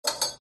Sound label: toilet handle; fox audio